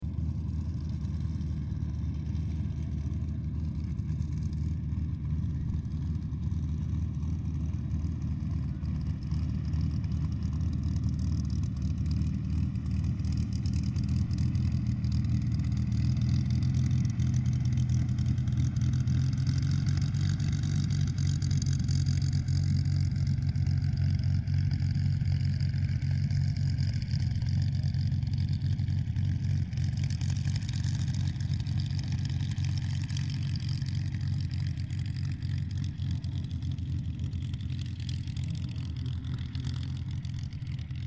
aircraft 1944 supermarine spitfire mk-xvi taxi distant stereo